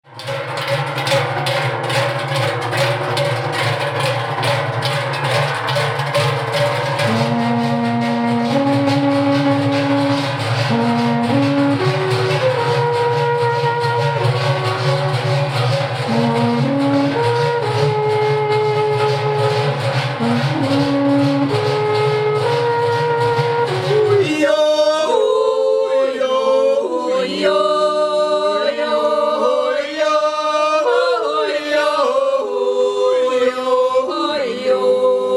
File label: music